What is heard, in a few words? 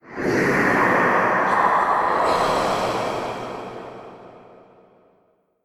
transform; gwyn